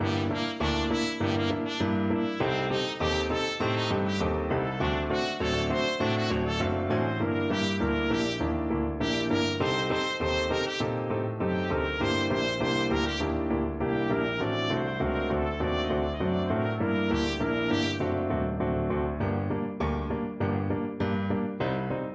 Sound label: stomp trumpet